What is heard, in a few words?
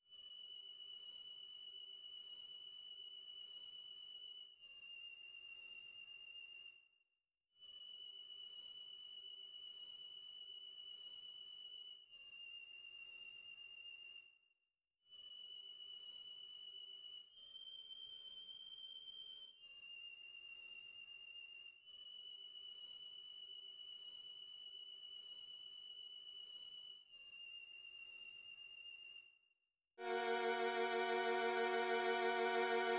Violin